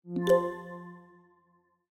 charging; Xiaomi Redmi-Note-6-Pro

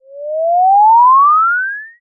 Rising, notifications; China Telecom

